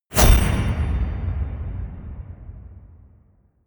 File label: high sword wobbler; hits explosionen; metall